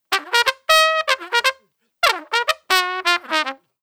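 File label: 120 Nu Jazz Trumpet, C major; Brass; Pro Tools Essential Loops; Digidesign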